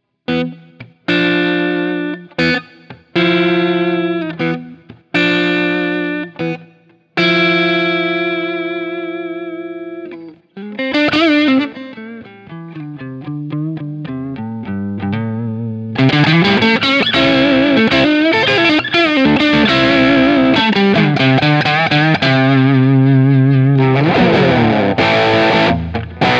Guitar Dynamics 4x12 Vintage Basketweave; Scuffham Amps S-Gear The Stealer

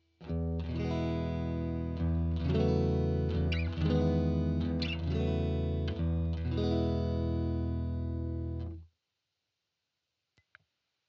VALETON Dapper Indie Drive chords

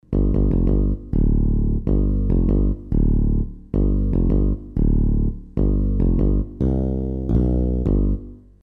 Recorder App Bass; Artinoise

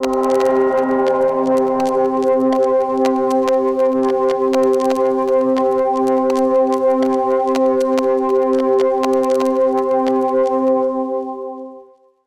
Regular Engines FX; SonuScore Nordic Spheres

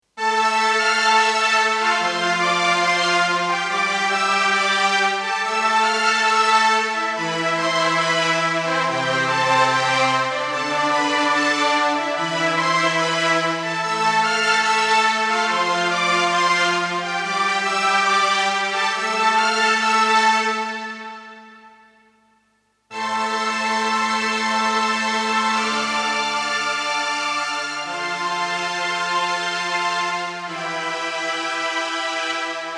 Behringer Solina Viola Violin Mod Reverb

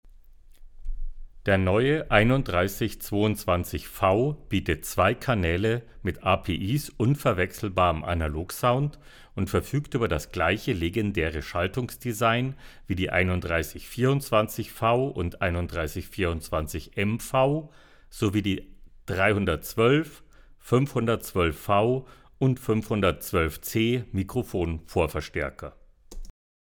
X6 API Plugin

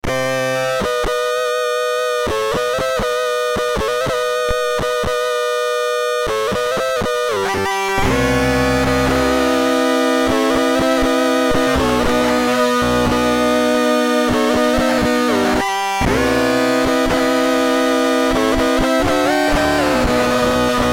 Swarm Fuzz Pedal; Beetronics

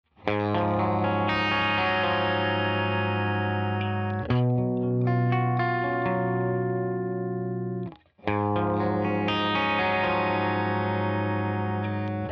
Riff clean processed

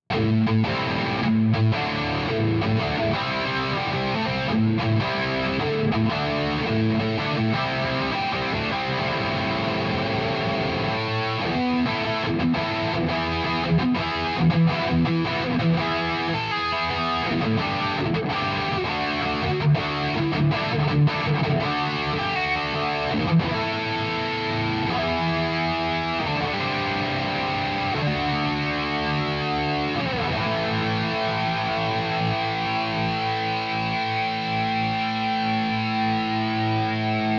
Lead; Headrush Core Tread

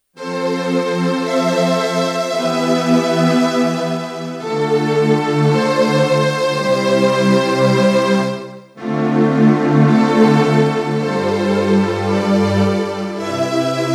Roland E-X10 Strings

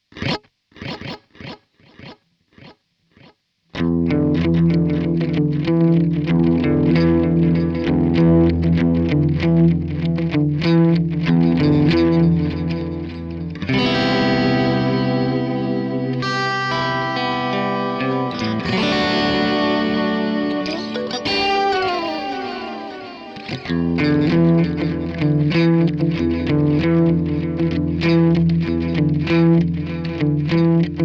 Ambient Echo; MXR Joshua

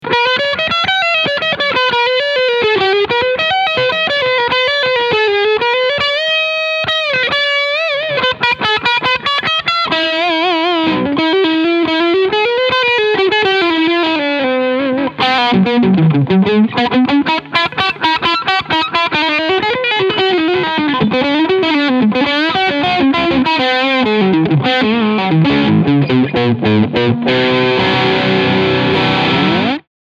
Harley Benton SC Junior LTD Overdrive Lead

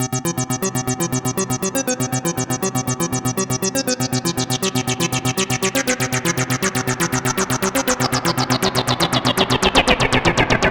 Fast LFO FX; Cherry Audio Mercury-4

